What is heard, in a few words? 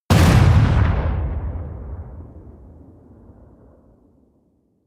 missile small explode close